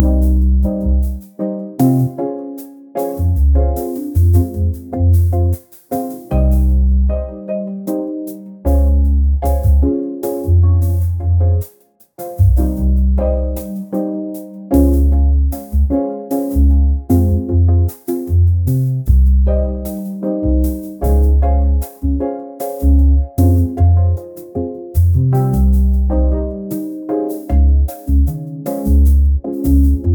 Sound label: a vibrant 57 tempo kpop